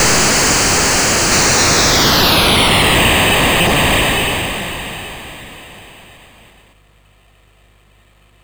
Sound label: sid MOS6581R4; Movie Monster Game